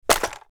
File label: crate destroy